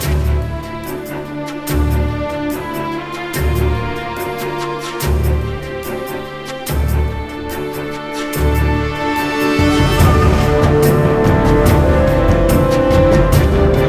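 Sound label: Low Combat loop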